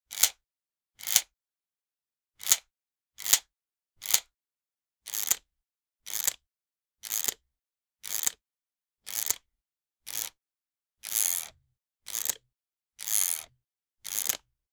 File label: camera shutter